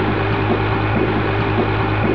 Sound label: boat idle; ambience; svencoop; azuresheep